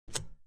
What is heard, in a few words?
switch; doctorwho1200